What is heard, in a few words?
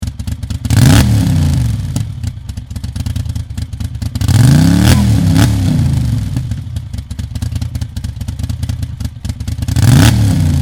guzzi start